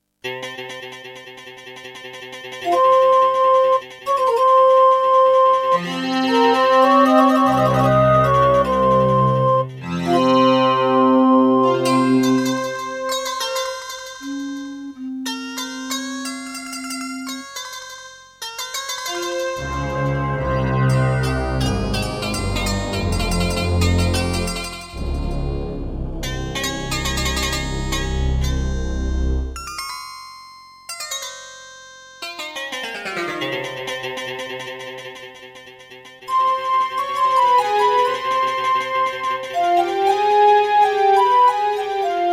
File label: Terratec MiniWaveSystem TTWT; Prince of Persia 2